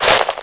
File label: footstep crunchy; creatures; footsteps; mono